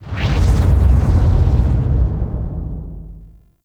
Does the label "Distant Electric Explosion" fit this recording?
Yes